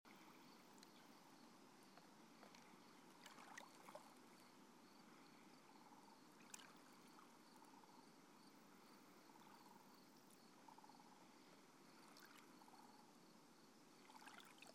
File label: Beach with crickets sandhill cranes and red squirrel; Carnelian Beach; Isle Royale; Siskiwit Bay; beaches of Siskiwit Bay